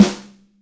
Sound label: Wood, Yamaha DTXpress Acoustic Snare